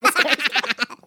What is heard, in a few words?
awesome laugh sped up